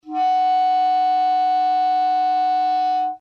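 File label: F4 with G sharp 5, clarinet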